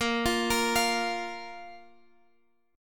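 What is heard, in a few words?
A sharp 5th chord, Guitar, Open G tuning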